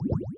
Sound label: Underwater